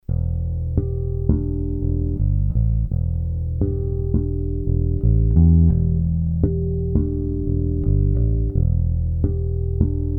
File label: Ampeg SCR DI Sounds